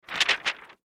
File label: Paper Flap Sheet Shake Movement; Office; Materials